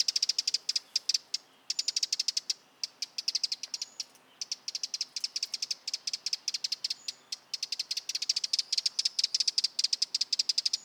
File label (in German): Vogelstimme Zaunkoenig, Eurasian Wren, Troglodytes troglodytes troglodytes; Vogelstimmen